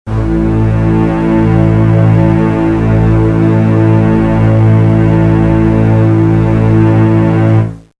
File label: bass A; tuneguide